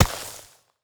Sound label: bullet hits sand; soil